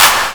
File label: Clap; Vengeance Samples; VEC1 Claps